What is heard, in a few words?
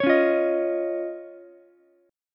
C sharp Major Add 9th C sharp, Ukulele; strummed; chord; JGuitar